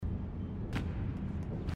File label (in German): runterspringen; Little Nightmares; gamesoundopedia